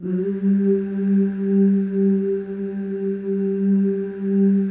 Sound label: OOSC, FEM OOS, Voices